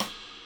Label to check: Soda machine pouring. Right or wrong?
Wrong